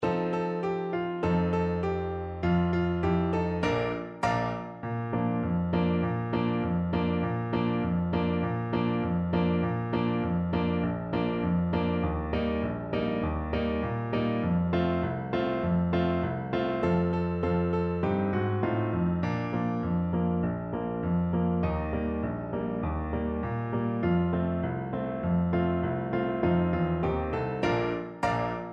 trumpet

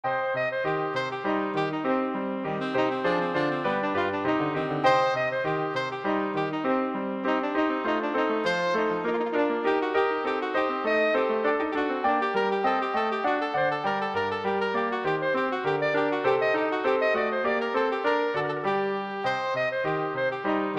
badinerie, saxophone

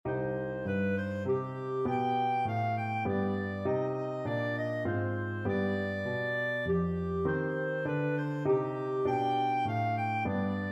air, clarinet; purcell

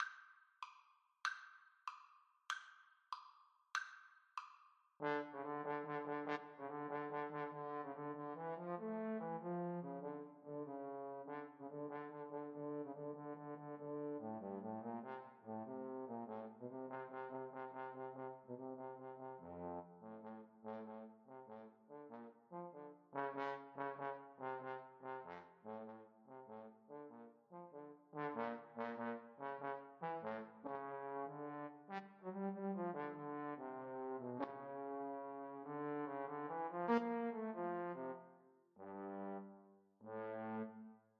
Trombone Duet

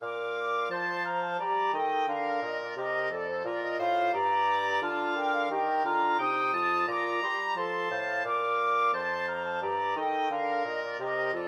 hornpipe, flexible mixed ensemble 4 players

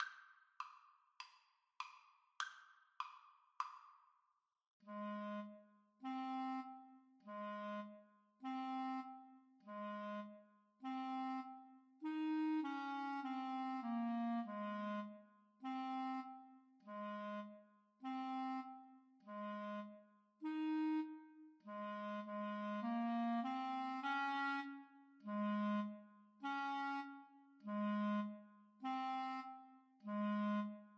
Clarinet Duet

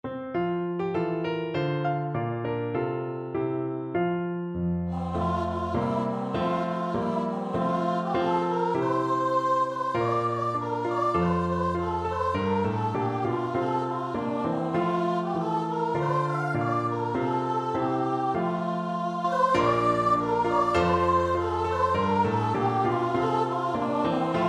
voice

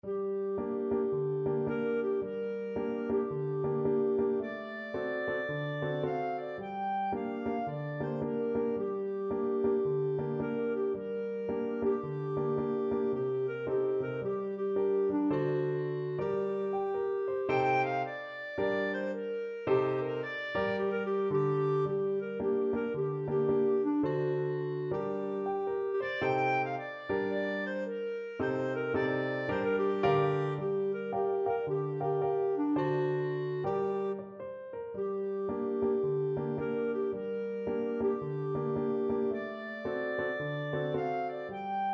wade in the water, clarinet

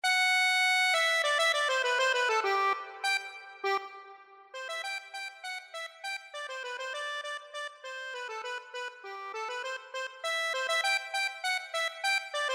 kipera, accordion

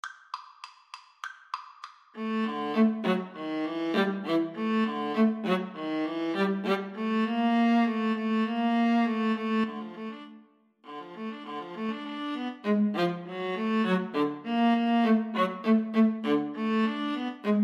rag viola duet

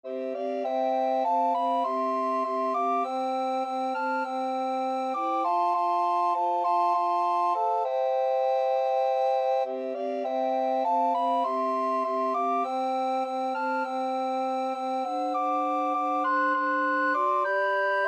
jesus came, Recorder Quartet

